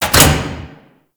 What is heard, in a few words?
Infested Metal Clunk; Warframe; Corpus